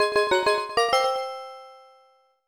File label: Jingle Vs Win; Super Mario 64 DS; NDS